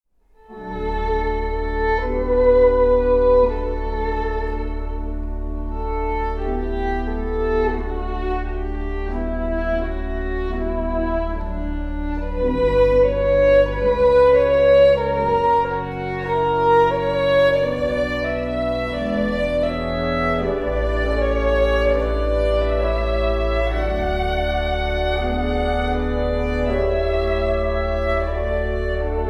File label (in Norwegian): fiolin